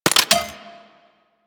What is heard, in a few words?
Laser Aim Rifle Mech, Weapons; Grineer